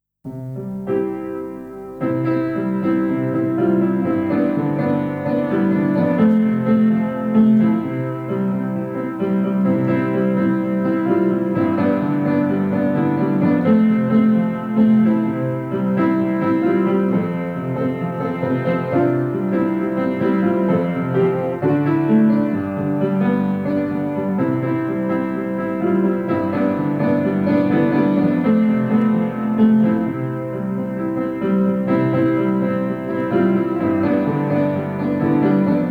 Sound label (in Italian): pianoforte solo musica; Cantici solo Pianoforte